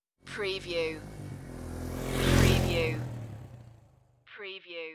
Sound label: Motorcycle Dirt Bike Drive By Left To Right